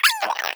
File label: vox land, creatures, snark